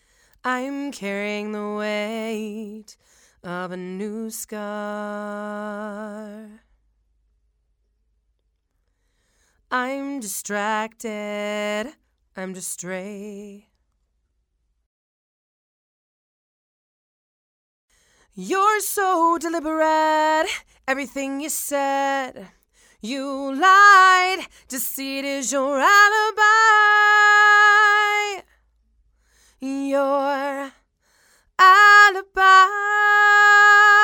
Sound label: Female Vocals; Torch